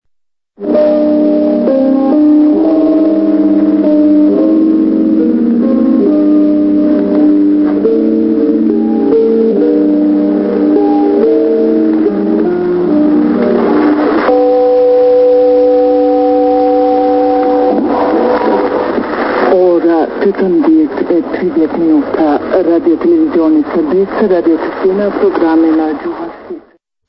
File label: interval signal; radio pristina